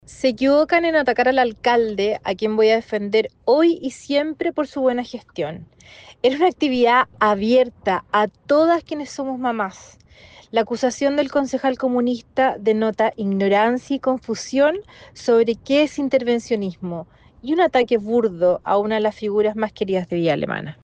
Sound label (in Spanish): canto karaoke; candidata; actividad municipal de villa alemana